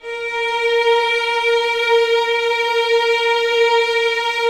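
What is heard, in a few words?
1st violins sustain A#3; Sonatina Symphonic Orchestra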